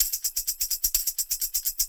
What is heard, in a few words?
Percussion Loops, Shaker & Tamb; Houseworx